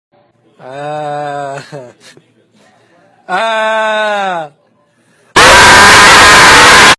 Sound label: aaaaaaaaaaaahhhh